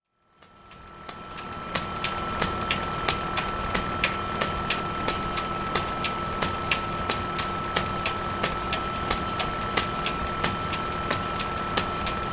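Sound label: sounds of a baggage carousel at the airport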